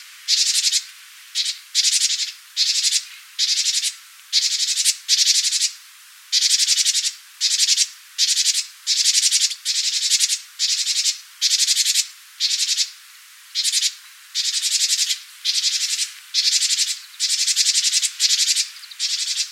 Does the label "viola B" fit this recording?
No